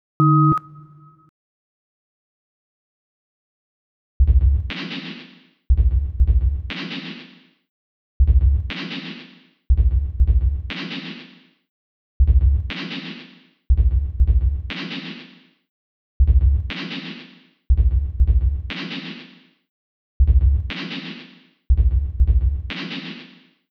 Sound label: Beat